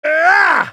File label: ultimate effort; Xinzhao; en US